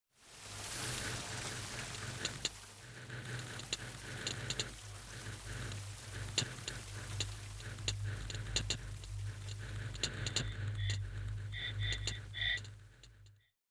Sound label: time clicks fire